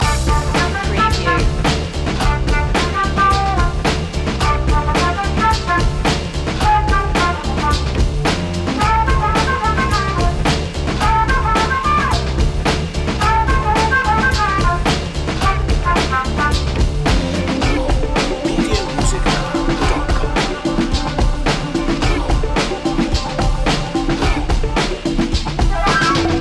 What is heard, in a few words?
sixties groovy cat loop